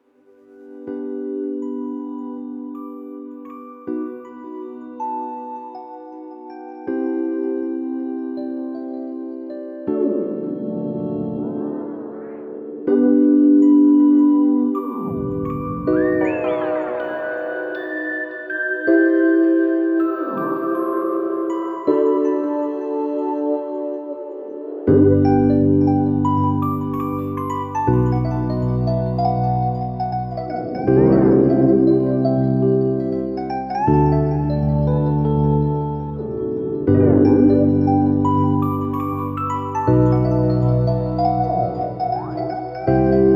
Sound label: e piano; flex